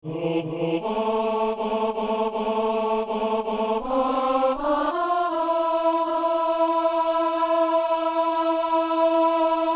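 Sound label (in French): psaume, ténor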